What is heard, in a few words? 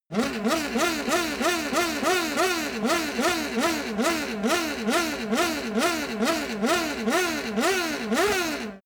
racing car f1 rev fast close